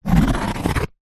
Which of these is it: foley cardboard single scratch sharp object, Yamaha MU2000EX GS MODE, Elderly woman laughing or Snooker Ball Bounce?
foley cardboard single scratch sharp object